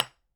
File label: hammer hitting stone, constru